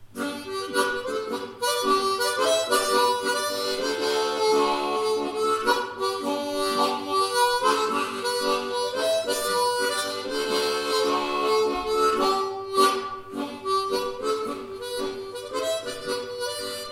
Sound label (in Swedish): Polska, munspel; efter Lappnils